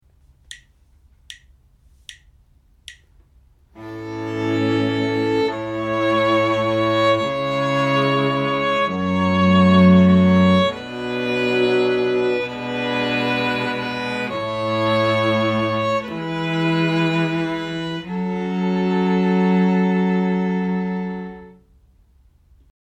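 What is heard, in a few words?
harmonic dictation, string quartet; ear training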